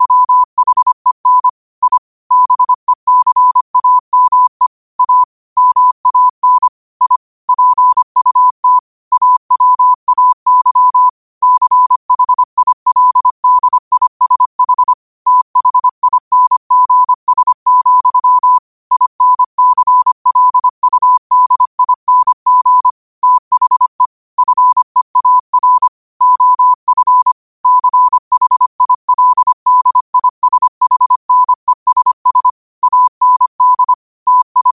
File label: 5 wpm, morse